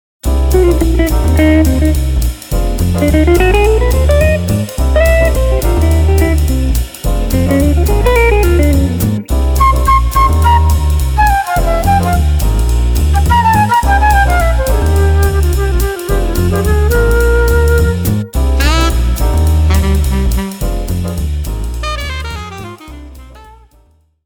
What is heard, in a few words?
JAZZ MOOD